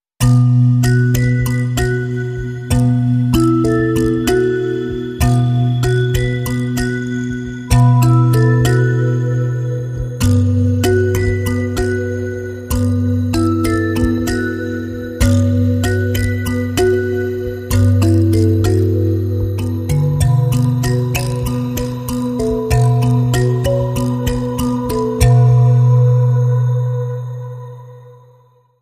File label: Layers of the Atmosphere Underscore